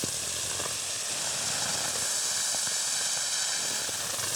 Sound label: kobold ignite loop